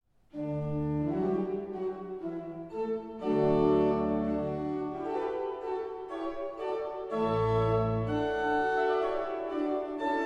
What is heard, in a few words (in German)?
andante D, orgeln; rinck; muensingen christus koenig